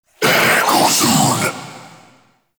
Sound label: Death Screams; Warframe; Dante